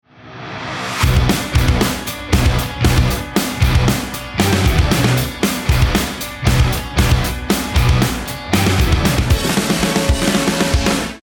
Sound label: bomb ten sec count